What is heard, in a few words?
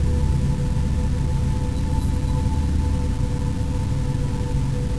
song; Older V-Chat Homespaces